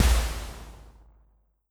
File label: laser gun